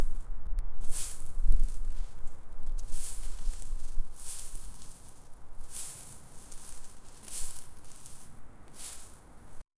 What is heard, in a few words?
sand